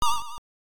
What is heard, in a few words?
Title Beeper; Hydrofool